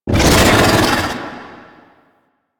Attack Vocals; Golden Maw; Warframe; Ambience; The War Within

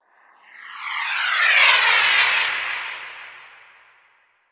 Portal Random A; Crp Exterior Gln Interior

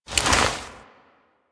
Fall From Cryopod Foley; Tenno; Warframe; Tutorial

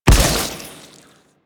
Secondary Barrel Explosion, Weapons; Warframe; SU Modular Guns

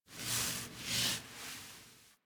Foley Idle Alert Head Down; Enemies; Kubrow; Warframe

